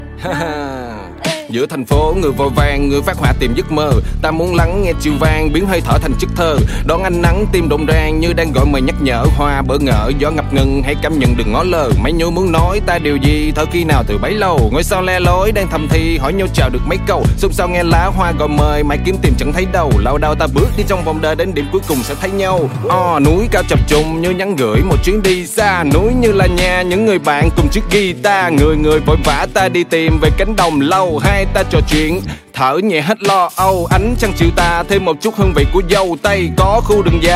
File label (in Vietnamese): Nhac Chuong Chill Rap